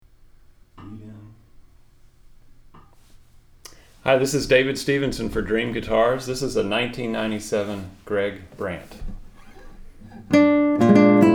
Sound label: concert, classical guitar, nylon string guitars; brandt